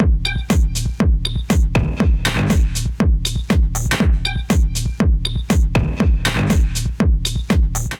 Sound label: Kit, Drums; Branded Beef; Ableton; Factory Packs; Punch and Tilt